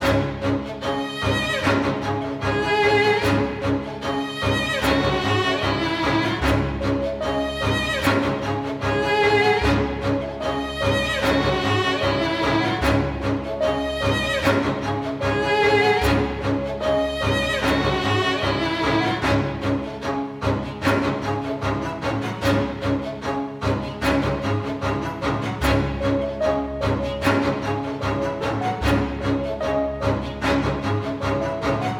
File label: 150 Bpm Key C